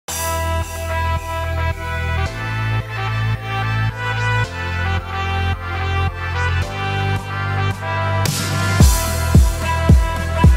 arabic remix

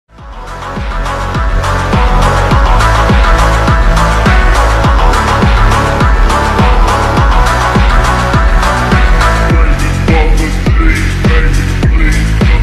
slowed reverb

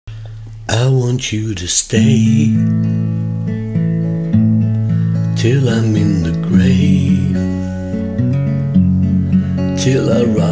acoustic cover

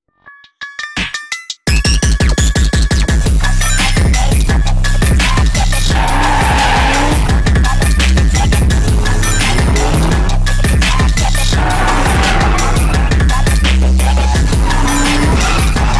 phonk

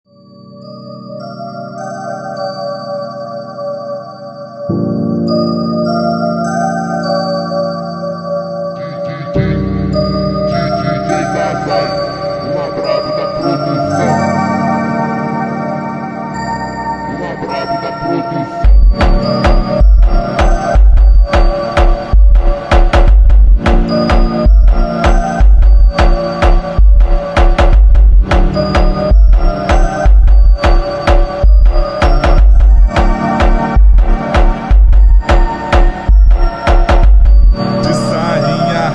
montagem slowed reverb

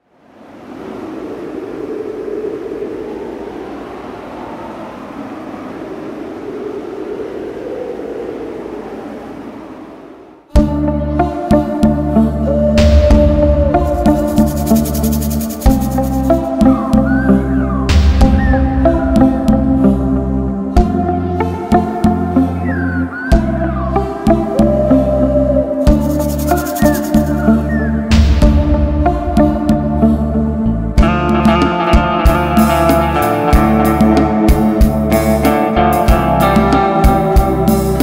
guitar instrumental; the last of the mohicans

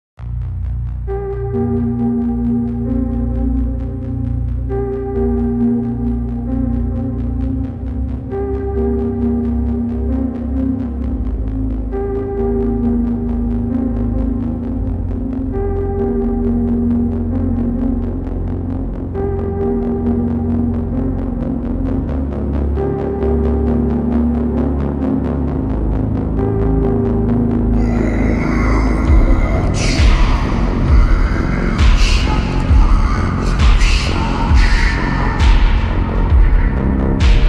ultra slowed reverb

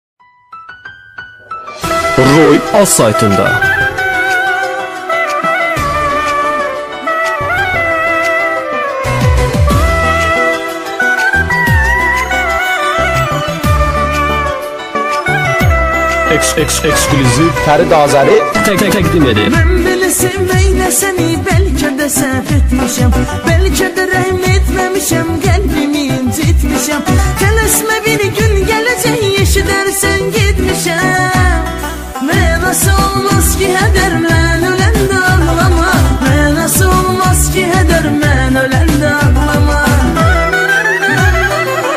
Azeri bass music, qəmlli mahnı

